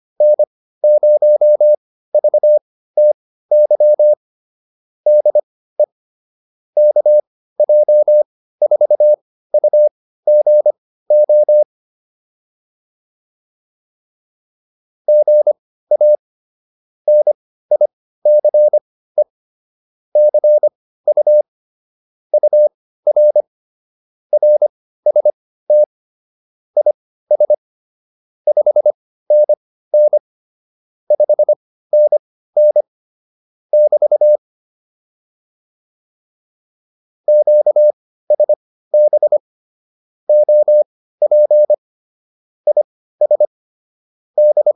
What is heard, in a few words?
QSO, intermediate practice files